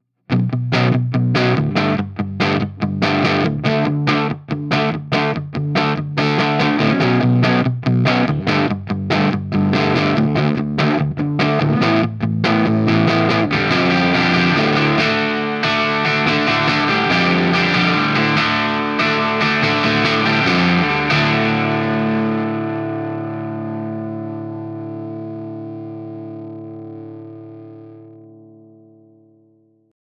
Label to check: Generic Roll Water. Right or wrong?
Wrong